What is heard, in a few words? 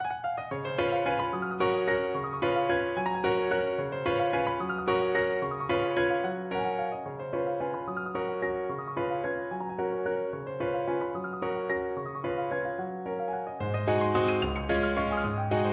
Waltz, piano; Opus 70 No. 1